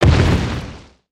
Footstep; Burno; aronwk collection; ndaudio; creatures organic

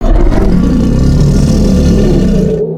Dragon Idle; creatures organic

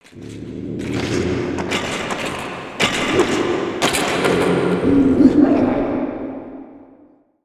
Cave Ambience; Skullken; ambience ninjago

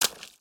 Foot Swamp Water Run; footsteps

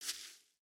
Foot Grass Walk; footsteps

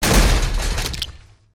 hedgehog smash; destructibles

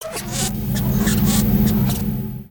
Clipperbot Idle, creatures mechanical

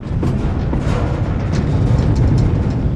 piston travel; ambience spaceship

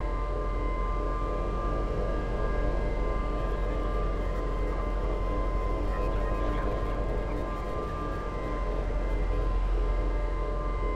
Entrance Halogram, ambience nexus tower

